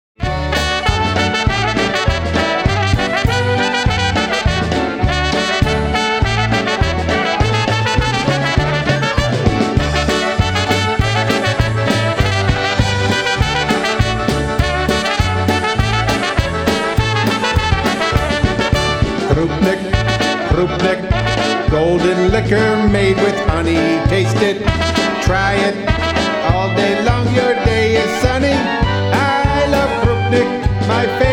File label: Polka